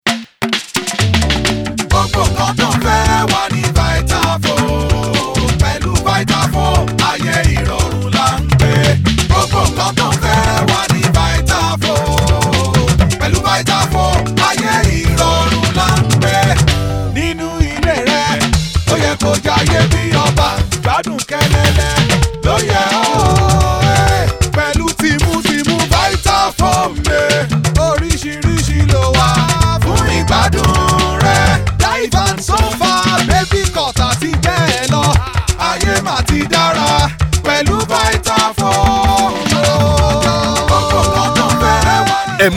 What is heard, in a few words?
Theme Jingle Yoruba; Vitafoam 2014